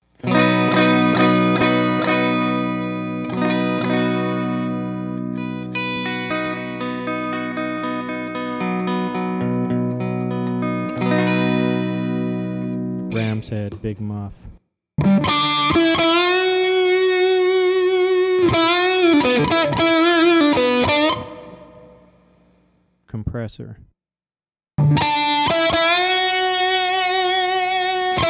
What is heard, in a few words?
TONE BUILD WITH EMGS